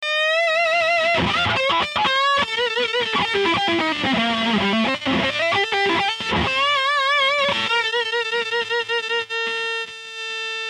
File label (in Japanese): boss ds2, effector